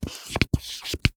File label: marker drawboard; writing